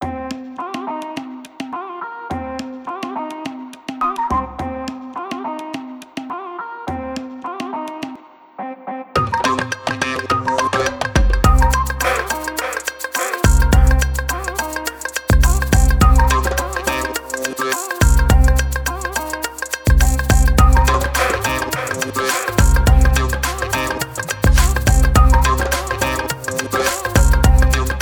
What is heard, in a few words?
india full mix; By Burak Sound Bank; hint tema